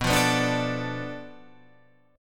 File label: B Minor 7th Flat 5th B, Guitar Standard; Bm7b5; strummed; 7,8,9,7,10,10; JGuitar